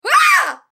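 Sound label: sounds of unpleasant shock in a person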